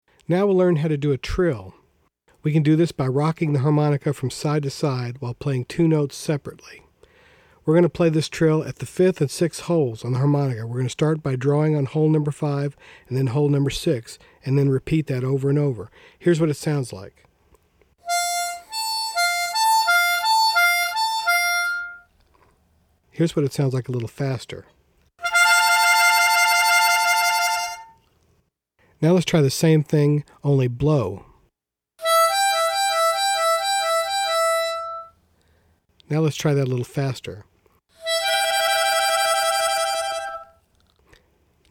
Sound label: Trills